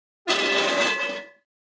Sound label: Metal Scrape On Metal